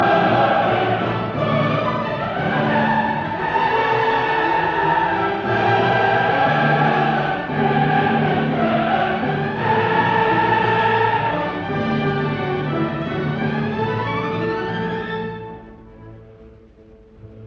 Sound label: Mass in D major Missa Solemnis Gloria Allegro vivace; Op 123; Bruno Walter